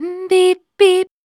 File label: VOC F, Dance Vocals, FEMALE